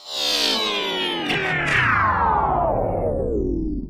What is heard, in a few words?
Power Down